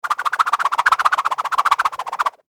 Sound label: Cartoon Whip Boomerang Whoosh Multiple; Sound Design